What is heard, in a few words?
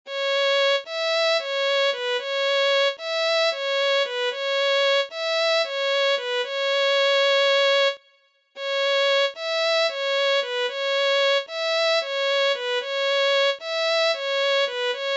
Violin